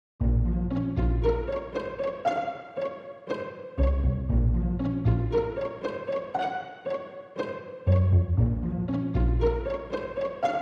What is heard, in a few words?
Strings Only